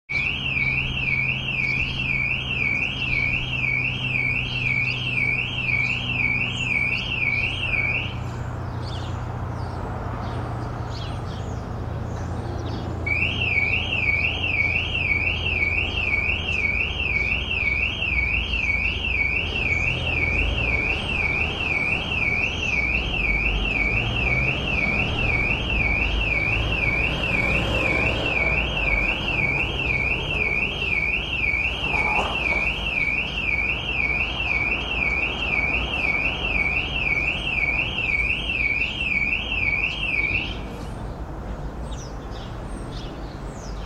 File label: Street ambience with car alarm annoyingly going on and off.; Alarms; Electronics